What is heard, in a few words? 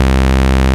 Basses C; Vengeance Samples